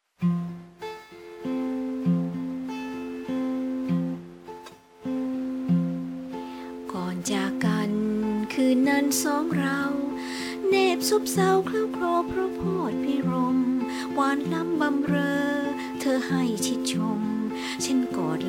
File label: Thai song